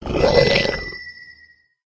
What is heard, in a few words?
stun; yasher